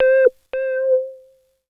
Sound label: SYNTH